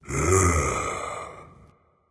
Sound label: content, voice; Smite; ymir skin6